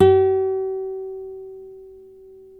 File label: NYLON NO VIB G#4C, Guitars; Spectrasonics; Hans Zimmer Guitars VOL-1